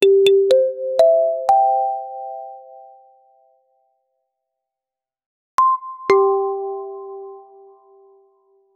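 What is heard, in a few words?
message notification; social media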